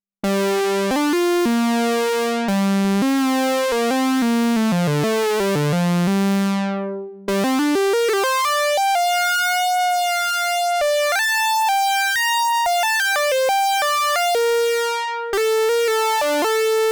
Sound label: Saw Lead